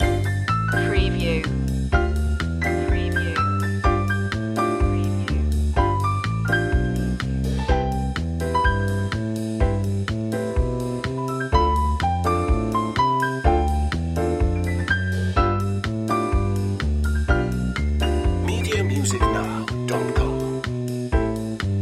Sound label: carefree day out loop